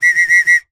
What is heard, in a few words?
foley scout whistle blow 4 times; ACME